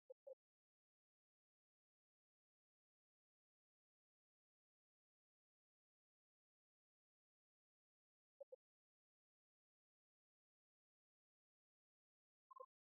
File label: Morning Hymn